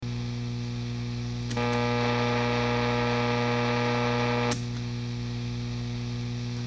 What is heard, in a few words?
OD808 isolated variable amp at noon